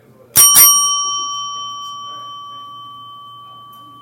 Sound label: Service Bell